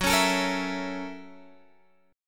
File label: F sharp 7th Sharp 9th, Guitar, Open G